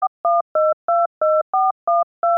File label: telephone dial